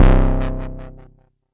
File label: mbrute syn, Arturia MiniBrute